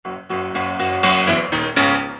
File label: door